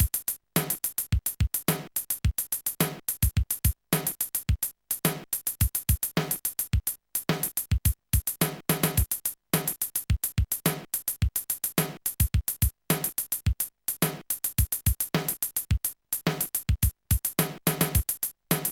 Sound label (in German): Rythmus; BeatStomper II v1.0; BSII-Patterns